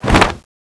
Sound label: ironfan draw